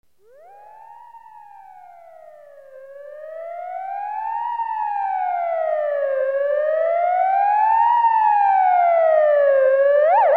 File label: Ambulance